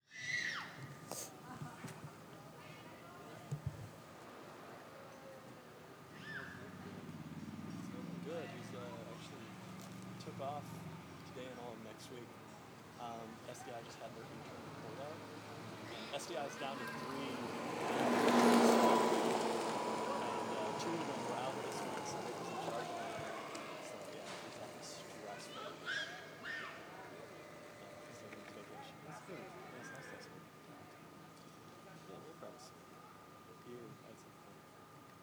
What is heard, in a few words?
PowWow Kids, cart; Menominee PowWow 4 Aug 2023; Menominee Homecoming Powwow August 2023